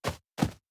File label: Birds Footsteps Loop; Josh Journey Darkness Totems; PS4, Switch, Windows, Xbox One gamerip